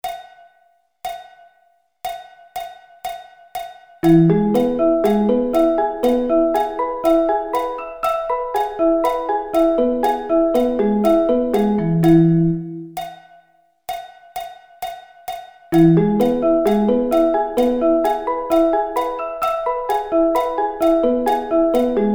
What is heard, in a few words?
E Arpeggio Inversions